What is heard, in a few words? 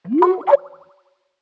new user; Netgear; SPH200W; Skype WiFi Phone